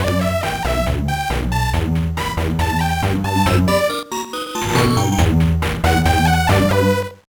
Bathroom Jam

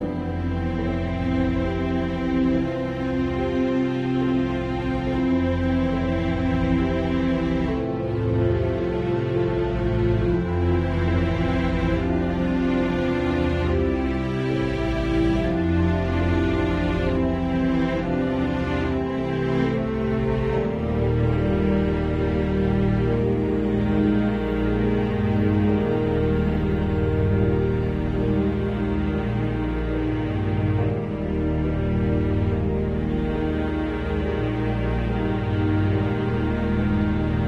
Neutral (Loop)

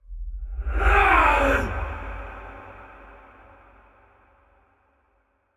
aaargh; Aragami

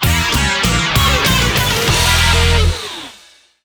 fanfares; NFL Blitz 20-03; GameCube

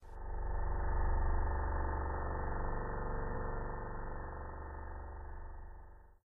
Electric Train ON; Granny 3; Android

